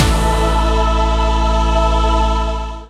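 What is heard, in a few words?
synthesizer Dance; Best Service Gigapack 1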